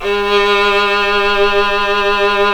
Synclavier Strings; ILIO

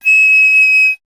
FLT PIC D#, Piccolo; Miroslav Vitous - Solo Instruments VOL-2

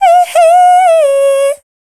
female dance vocals; Best Service ProSamples vol.12; AKAI

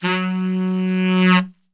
F#1 SAX, Brass Super Section; Best Service

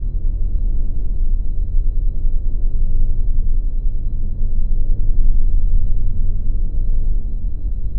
Drone Ambient; Drums & Drones

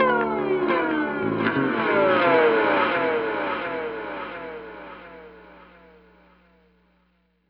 gliss tone, guitars; Big Fish Audio - Alien Guitars; GLISSENING

